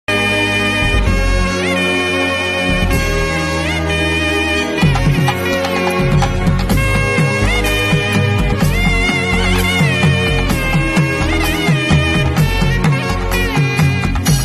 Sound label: love bgm; vallavan